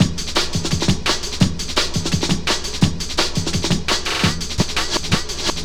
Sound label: Sweet Beat, Drum & Bass; Fluid Dynamics